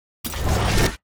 tier wings promotion from grandmaster

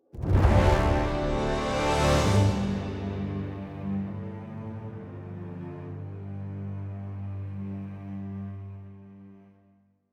combat conquest outro

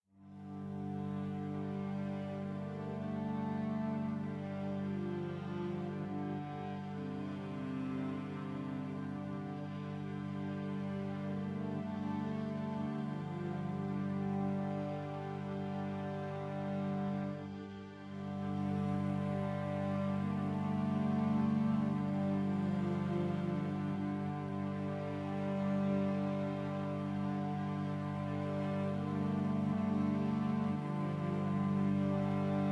mus ambient

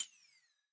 button upgrade click fail

